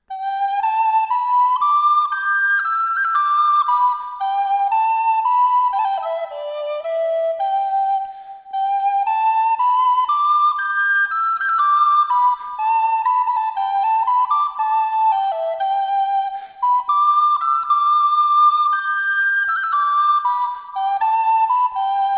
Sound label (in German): C Floete